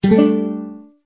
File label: Talk Start